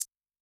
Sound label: Maracas; Yamaha DTXpress-II; Perc